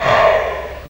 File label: HORROR HERR, VOCAL